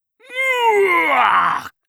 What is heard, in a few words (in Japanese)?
ぐぅぅああ！！！, 戦闘ボイス（中年・低音）